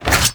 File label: Melee Block Start; Warframe; Wraith